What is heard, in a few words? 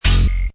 Hi Tech Panel Servo Button; High Tech Sound Effects; Lynne Music